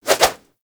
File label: Staff Swing Spin; Warframe